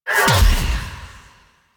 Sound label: Wisp Resevoir Impact; Warframe